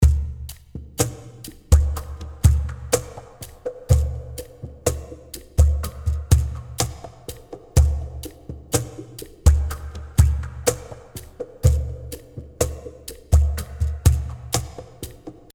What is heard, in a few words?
mc-520 slap mc-200 bass - cajon - wet